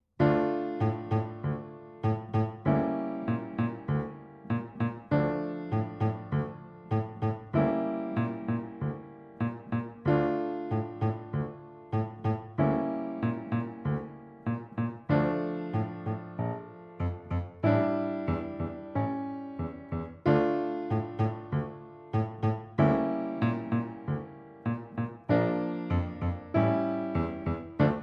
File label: Cello